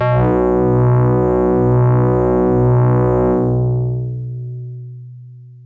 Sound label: Synth, Evil metal bazzz